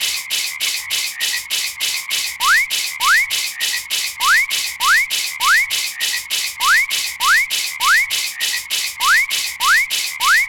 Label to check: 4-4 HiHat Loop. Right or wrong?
Wrong